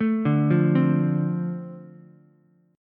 F 6th F down Ukulele; F6 arpeggiated

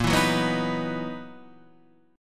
F sharp 13th A sharp

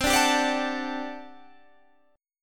G Major 7th Suspended 2nd Suspended 4th C

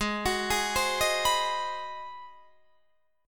G sharp 7th Sharp 9th, Guitar, Open D

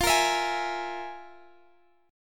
F Minor Major 7th Double Flat 5th, F; FmM7bb5; strummed; x,x,15,15,17,16

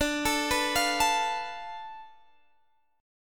D Minor 6th Guitar Open D

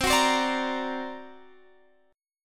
D Augmented 7th C, guitar

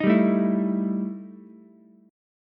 E Minor Major 7th Double Flat 5th E Ukulele; strummed; JGuitar

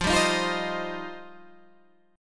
F 7th Suspended 2nd, Guitar Standard; F7sus2; strummed; JGuitar

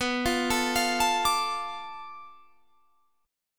B Minor 7th Flat 5th B, chord; Bm7b5 arpeggiated

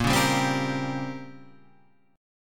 A sharp Minor 6th Add 9th A sharp down, chord

